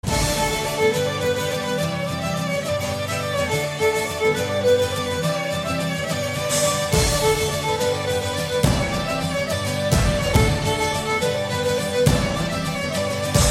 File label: irish violin; ringtone